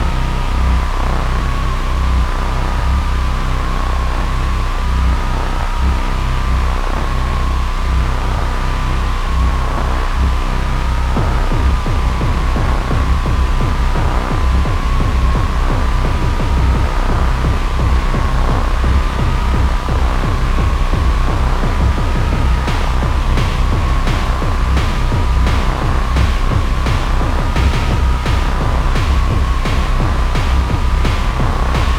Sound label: noise hardcore